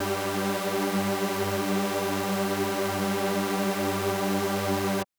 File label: SuperSaw G4; KG MegaSaur; M8